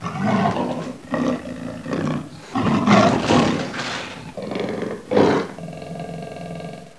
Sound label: roar, tiger